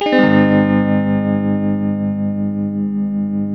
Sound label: TELTMUMAJ A, TELE CHORDS, Guitars; Spectrasonics; Hans Zimmer Guitars VOL-1